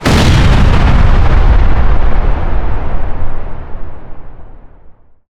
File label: explosion